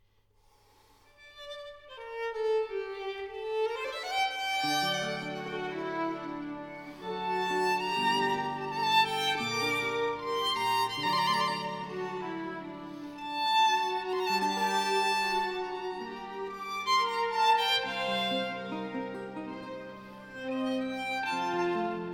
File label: Concerto for Violin, Strings and Harpsichord in G minor, Largo; Carmignola; Vivaldi